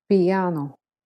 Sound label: piano; practice bulgarian